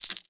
key pickup; svencoop; arctic incident; ambience

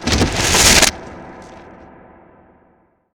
wood appear; ragemap2020

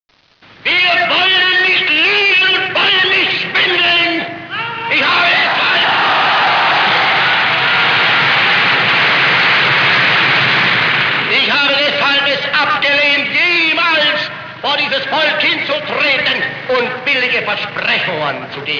alien squit, ambience; svencoop; cracklife

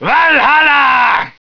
alert; svencoop; cracklife; garg

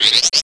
larva alert, enemies; zelda

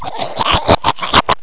pl swim, player; svencoop; cracklife

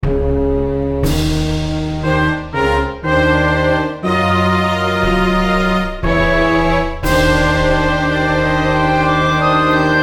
boss clear, music; zelda

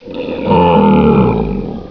death, shambler; monsters; svencoop; quake1

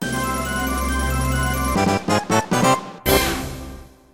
all win; w00tguy; botparty

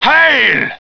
breathe; svencoop; cracklife; garg

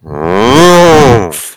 cow run; svencoop; cow tycoon; gonome replace

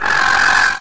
hc die, headcrab; mistake coop

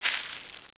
pl metal, player; svencoop; cracklife

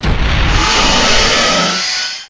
gohma intro, enemies; zelda

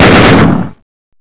mgun; svencoop; classic; zombieman

